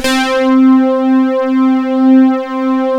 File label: TX C3, FAT PADS; Sound Gallery - Charged